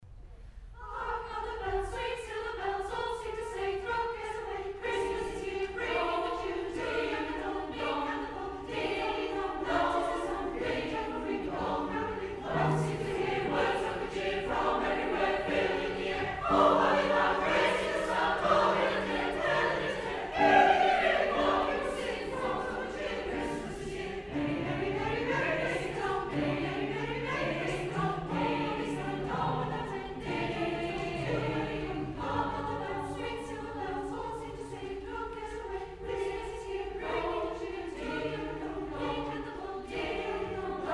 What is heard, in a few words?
Carol of the Bells